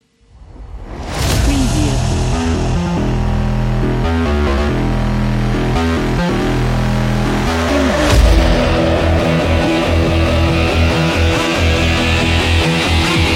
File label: sport aggressive big beat